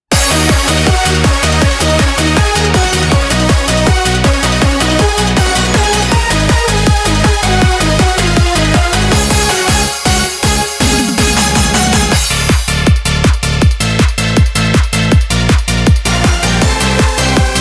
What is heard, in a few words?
instrumental